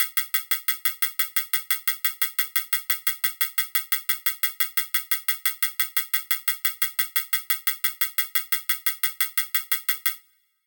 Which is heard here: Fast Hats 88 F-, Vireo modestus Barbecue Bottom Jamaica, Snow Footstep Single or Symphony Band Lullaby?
Fast Hats 88 F-